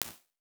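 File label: impulse response, waveguide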